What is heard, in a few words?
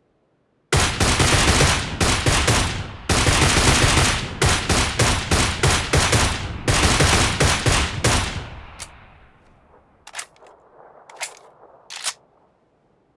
Normal Groza single fire